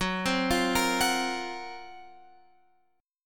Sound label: F sharp Major 7th Flat 5th, Guitar, Open F; F#M7b5 Chord; arpeggiated